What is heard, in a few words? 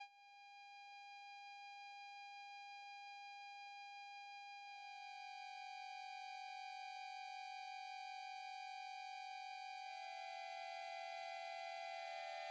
hum